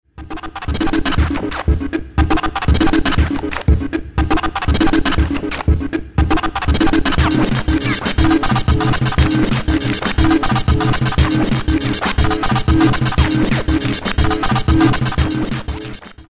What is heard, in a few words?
beats